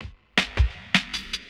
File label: DRUMLOOP D+B 160, Drum+Bass Loop; eJay